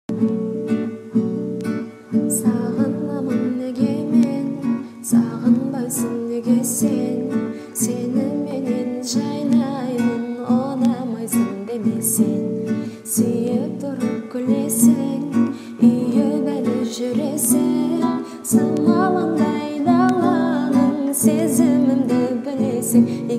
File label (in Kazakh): gitara